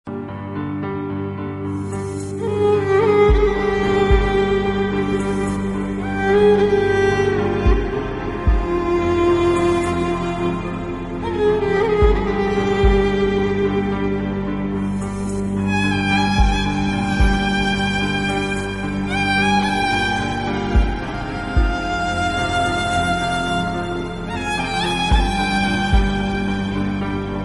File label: gham love ring, Sad Ringtone